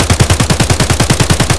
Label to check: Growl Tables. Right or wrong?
Wrong